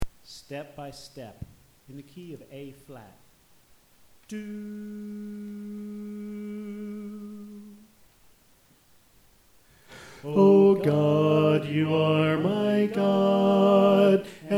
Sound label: Tenor